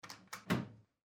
kitchen noise

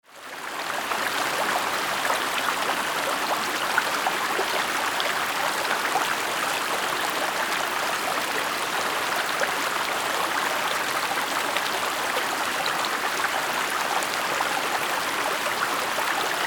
river medium size atmo

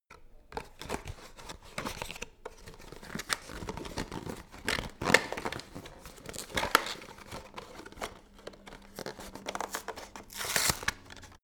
paper rustle and rip

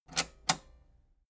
metal button robust spring click enable slow

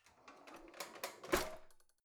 old drawer creaky slide softly out

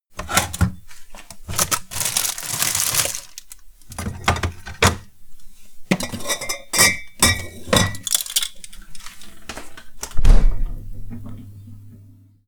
fridge snack attack

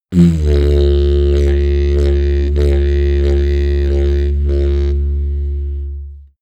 didgeridoo jump slow

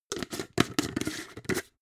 jar glass plastic close fast

